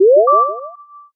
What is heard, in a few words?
unlock